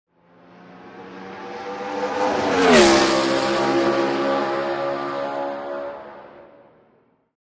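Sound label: Sports Car Close Up By Fast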